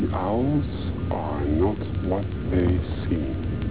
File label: owls; Le Géant: / the owls are not what they seem.